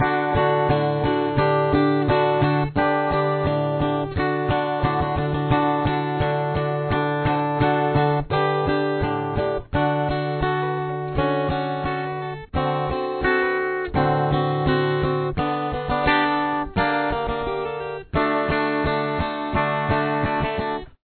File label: interlude, guitar